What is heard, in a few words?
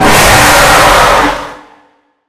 screamer; phantom harold